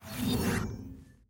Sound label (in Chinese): NBA 交易市场入场